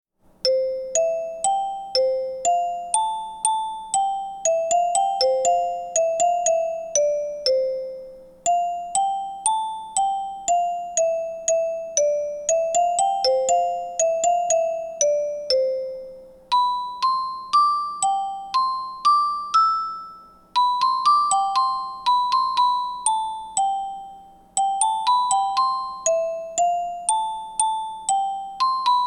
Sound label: kalimba